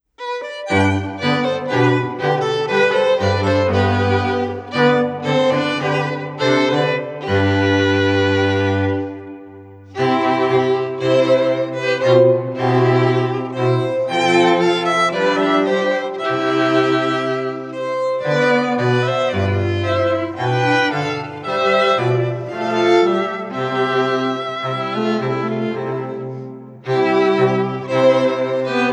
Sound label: White Lace (Processional), string quartet; “A Wedding by the Lake” String Quartet Recording